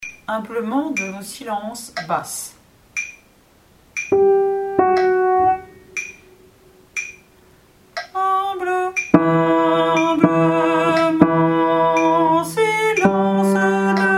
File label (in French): BASSE; Humblement